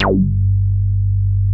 MOOG G1, ANALOG BASS; Music Store - Claudius Diemeter Schwerlos

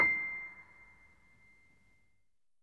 MONO, Acoustic Piano; InVision Interactive; Miroslav Vitous

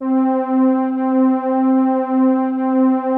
WARM STRG C4, SYNTHSTRINGS; Music Store - Claudius Diemeter Schwerlos

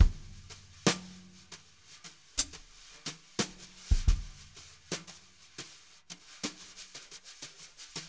jazz brush loops

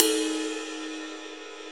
Ride Cymbal; Digital Sound Factory Planet Earth

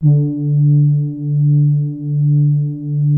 soft brass D3; SOFTTRIX; Music Store - Claudius Diemeter Schwerlos

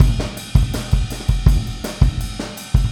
Boogaloo Chorus Kick, 82 BPM

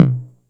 Tom Delay, TR-606 Bent Samples; The Electronic Music Manuscript Collection; Battery 3 Sample Kits

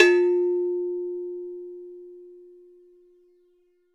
AF AGOG, Agogo; NorthStar - Global Instruments VOL-2

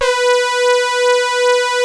STAB, HARD BRASS; Music Store - Claudius Diemeter Schwerlos

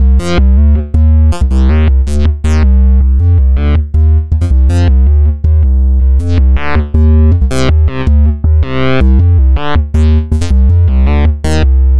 Synth Bass

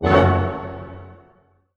F# MIN PP, ORCHHITS; Sound On Sound; Peter Siedleczek's Orchestra

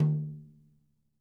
mid tom

